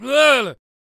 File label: Brawler Attack Vocals